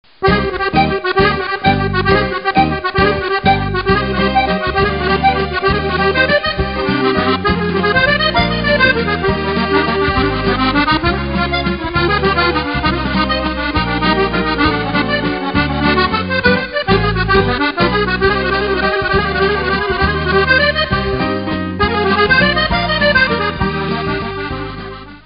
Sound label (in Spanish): acordeon musica; EFECTOS DE SONIDO DE AMBIENTE